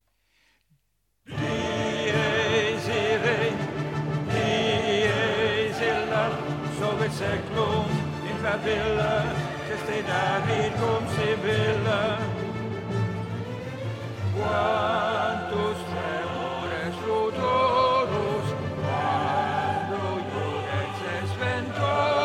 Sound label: Alto Dies Irae; Mozart Requiem